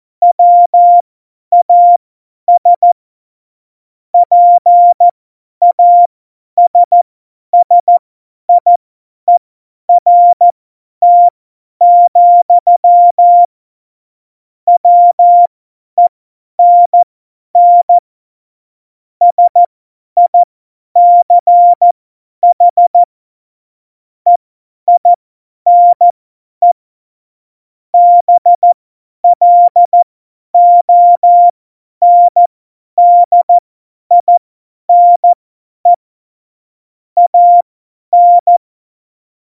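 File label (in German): Witz, Tempo 70, Morsekurs, cw morsen; Witze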